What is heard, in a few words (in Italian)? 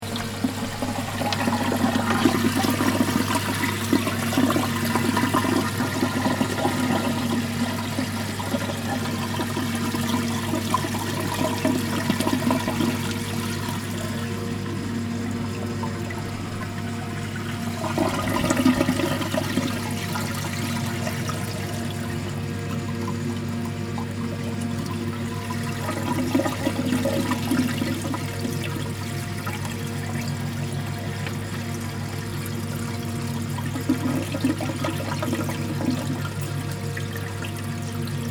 suono macchina elettroerosione; borgo sacco